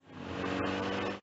car acceleration; traffic command

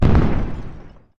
mine explosion; frontline

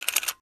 reload complete; furious road

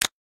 button; stack jump

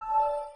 click; puppet soccer

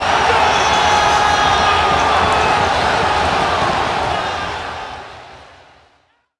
crowd; quarterback

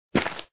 landing; stack jump

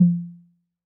shoot; dunk shot2

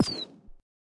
swing; destroy boxes2

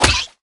enemy die; tower defense

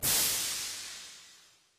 hit geiser; stinkfly; steam camp

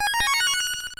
victory; mega runner

